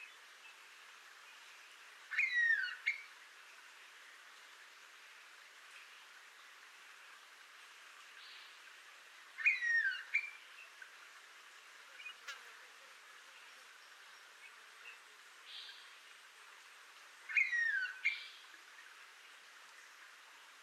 blue pitta, پرنده پیتای کبود

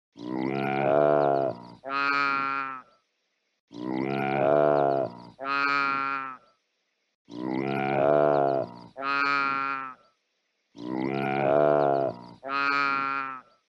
Tibetan antelope; کل تبتی

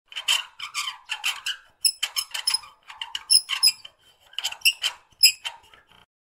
Metal squeak

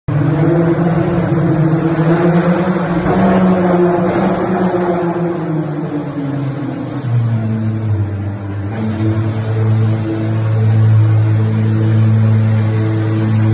De Tomaso P72

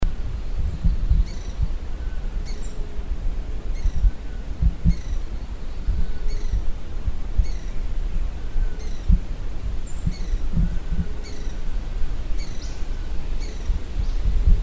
Brazilian ruby; پرنده یاقوتی برزیلی

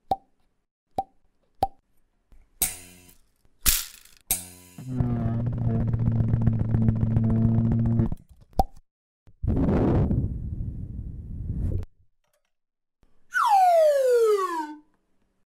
Jumping in game; پریدن در بازی

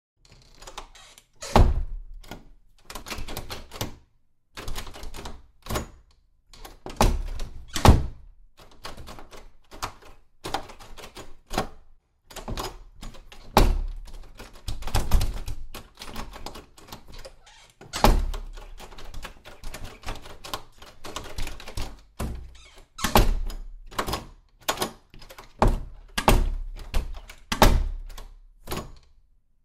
Door Handle

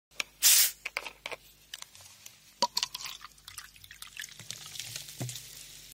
Pouring soda

